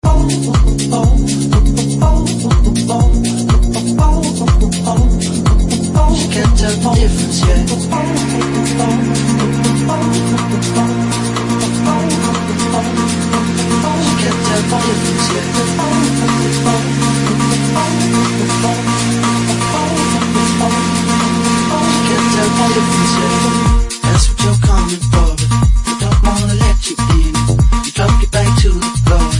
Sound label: groove basement